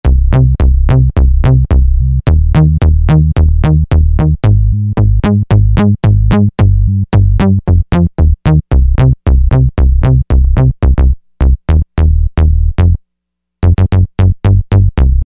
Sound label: Qube Bass; Roland SRX-05 Supreme Dance